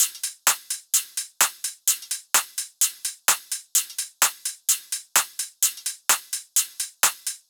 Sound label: Shuffle Top Drum Loop, 128 BPM; Cymatics; House Starter Pack